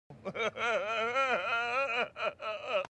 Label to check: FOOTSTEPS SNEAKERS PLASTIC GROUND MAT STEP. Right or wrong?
Wrong